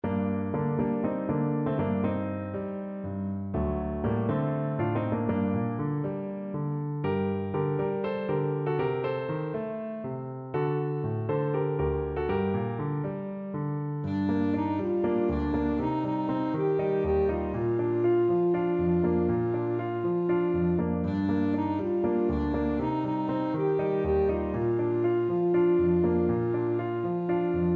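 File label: alto saxophone; ludo mlado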